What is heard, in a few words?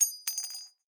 sniperrifle shell